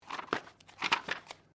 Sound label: Book Page Heavy Turn Double; Book movement; Books (Sound Effects:Offices)